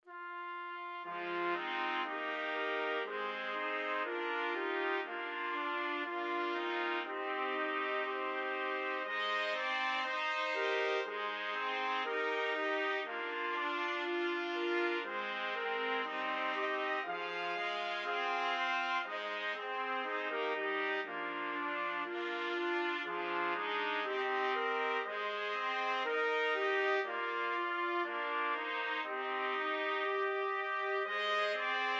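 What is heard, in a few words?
4 trumpets; trumpet quartet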